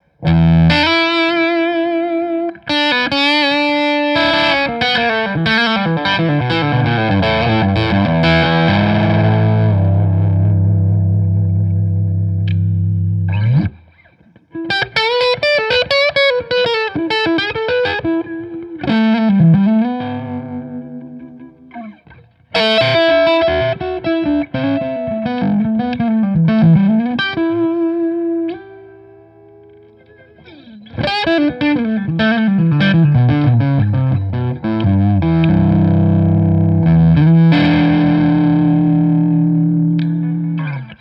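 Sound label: Collings i-35 DLX overdrive, electric guitars, semi hollow; 2007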